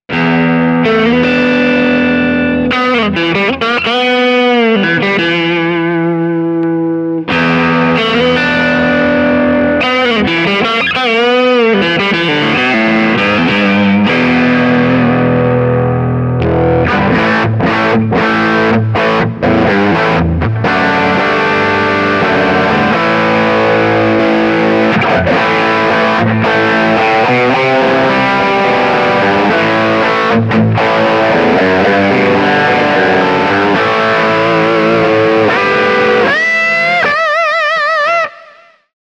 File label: Carl Martin PlexiRanger Loop; Kemper